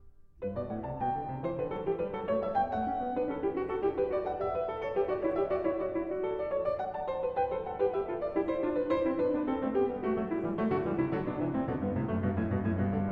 Bach Goldberg Variations BWV988 Variation 17 - Allegro